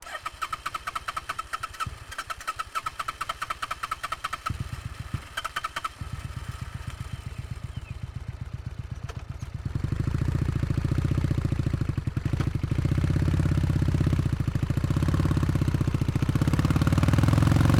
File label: Suzuki VS 800 GL Intruder, Onboard, Cruising, City, long engine start, slow cruise; Cactuzz Sound